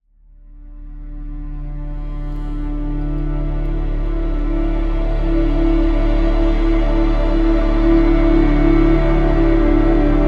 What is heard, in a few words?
Alone at Sea, Textural, Bass Heavy